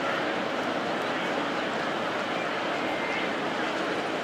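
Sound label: BMX Crowd Ambience Idle Loop; SFX Kit; Crowds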